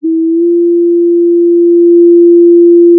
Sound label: pop, Sound of a cruise ship horn